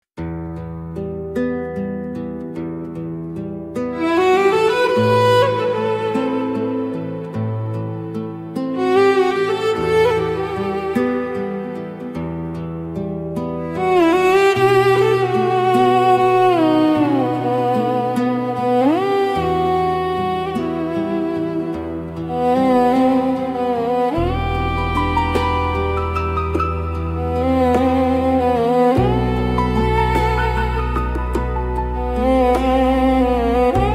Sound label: Refined World Fusion Instrumental, Deep Evocative Soundscape